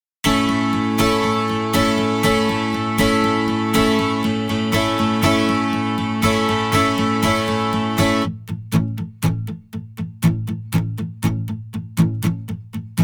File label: britpop all